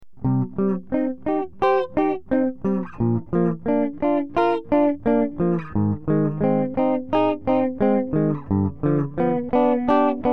Chord harmony, guitar; Two Part Harmony/One Guitar; Cm Bb Ab Gm7; Harmony 2

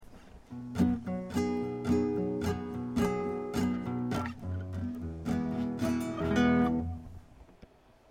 cowboy seventh, guitar chords; Typical Country Resolution

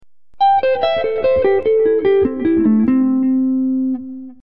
Penta Note Skip, guitar

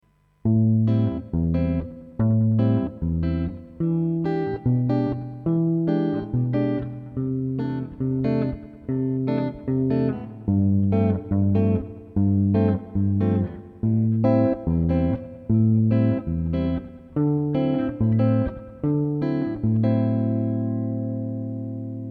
Bossa Nova, guitar

